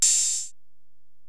HiHat, Alesis DM-PRO

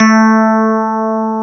FM Rhodes; Club-50 - Foundations Roland

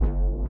LIQUITAR; Spectrasonics; Metamorphosis

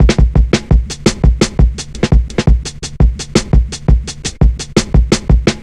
170, drum loops, drum & bass

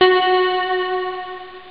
voice; Amiga Soundtracker Sample Packs

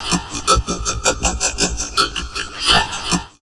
VOCOD, Drum Loops Crazy Processed

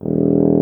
tuba; Miroslav Vitous - Solo Instruments VOL-2

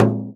FRAME, INDIAN PERC

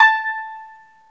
ETH KANUN, Eth Plucks, Saz & Kanun

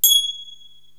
Finger Cymbal

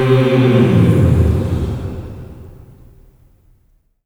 OCT FALL, voices; OCT.FALLS

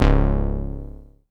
Fuzz Analog Bass; Big Fish Audio; Old Skool House; OSH Bass

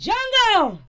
VOX, VOCALS; Ueberschall; Jam Box

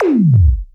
kit, Yamaha Motif-ES8

